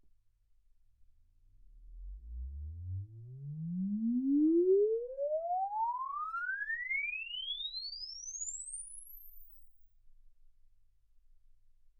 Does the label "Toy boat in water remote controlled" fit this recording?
No